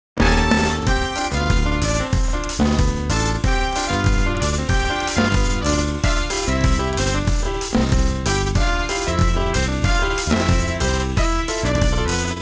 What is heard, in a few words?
Jazz